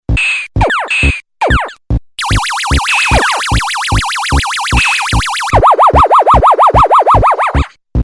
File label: Arcade; Space Invader